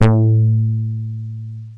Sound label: bass